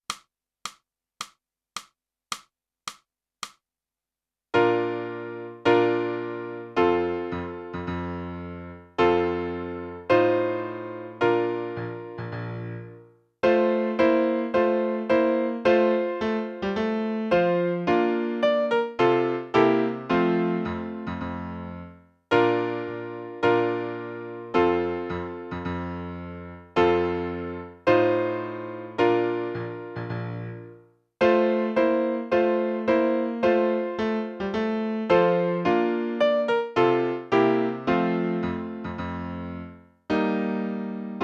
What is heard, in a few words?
sax